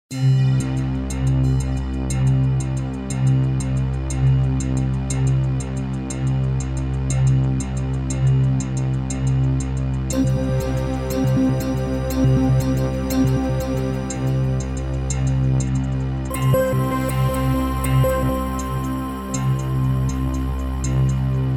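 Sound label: background music for an excursion without words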